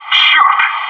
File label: aah, bot; maxpack